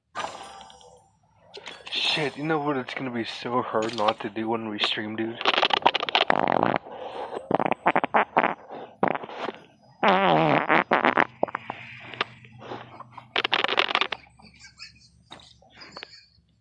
fart, just me farting my brains out

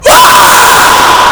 scream

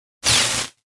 lightsaber glitch

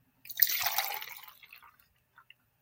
pouring milk

